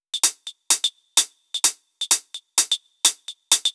Loop, 128 BPM

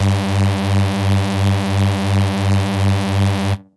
Dirty Dutch Lead G2; Vengeance Electroshock Vol.2; VES2 Dirty Dutch Special; VES2 Dirty Dutch Leads

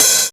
Open Hihat

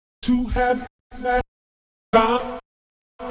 126 B VOCAL LOOP, Funky Underground; Loopmasters; Funky Underground Vol 2

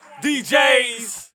VOX

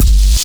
Kick

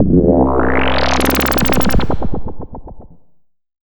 FX Downlifter; Mutekki Media; Vengeance Electroshock Vol.2

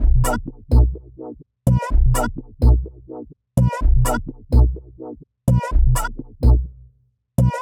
126 B COMBI GROOVE; Loopmasters; Funky Underground Vol 2; COMBI GROOVES

